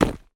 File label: footstep dry forest floor; foley